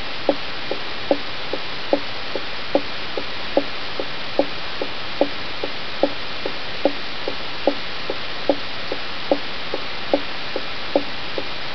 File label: video camera